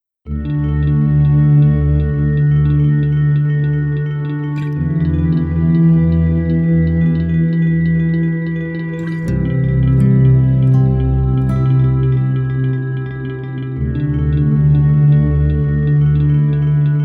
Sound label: Gentle Waves